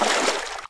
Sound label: water movement; Spearhead Demo; MacFormat 2003 June